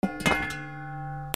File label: GARAGE